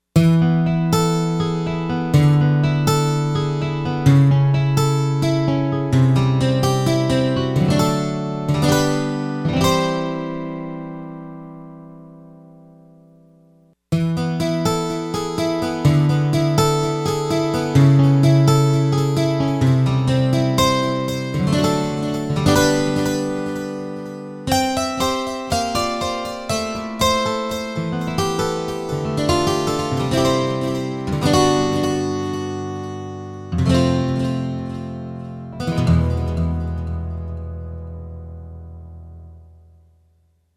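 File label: Tap Delay Reverb, Lexicon MPX 1; Factory Programs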